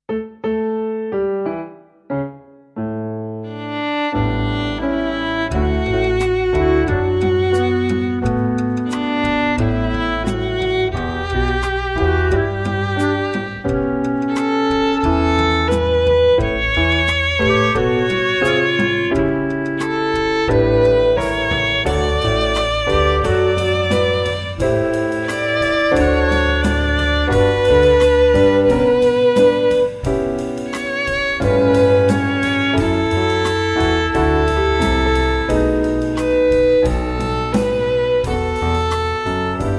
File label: Lazy scale